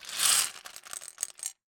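Gumball machine, metal, glass, candy, flip, jostle, clatter, quick, wide; Eiravaein Works; Vaeyan IV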